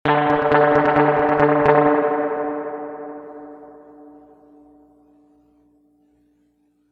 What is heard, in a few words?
mod reverb tank 2 spring medium staccato